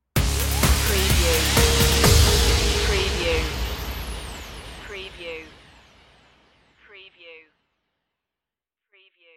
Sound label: pass me by stinger